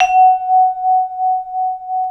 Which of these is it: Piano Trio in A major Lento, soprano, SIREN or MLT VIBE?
MLT VIBE